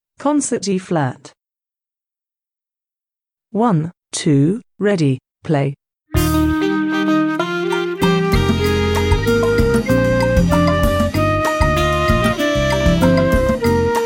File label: concert e♭ scale